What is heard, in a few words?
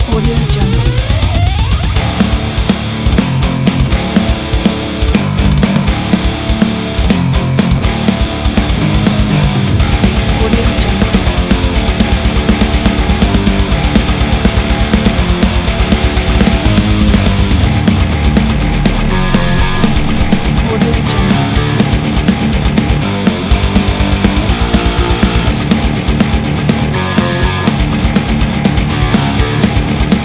metal; heartdrummachine